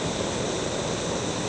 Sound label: Hiss